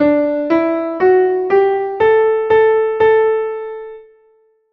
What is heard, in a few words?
viola example